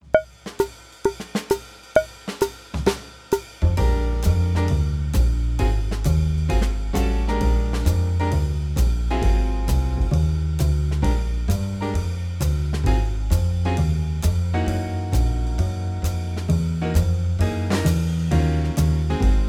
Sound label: Improv Backing Track Subtonic Blues